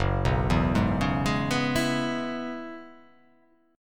F sharp 9th Suspended 4th F sharp, chord

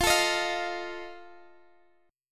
Eb Suspended 2nd Flat 5th F

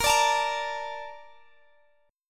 Bb Major 7th, Bb, down, Guitar, Open G

